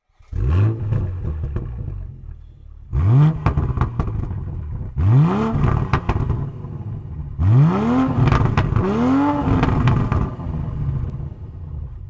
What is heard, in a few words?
Evolution Link pipe set Slip On BMW Z4 M40i